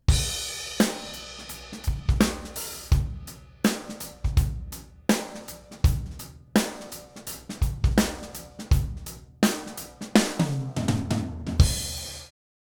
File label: Groove Drum Dry